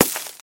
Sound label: hit